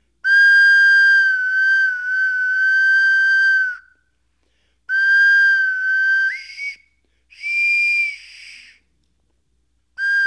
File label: solo